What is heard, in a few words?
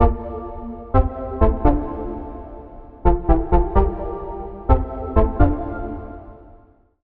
Key; Routed Sound; Sylenth1 Expansion - Star Scavenger Vol.1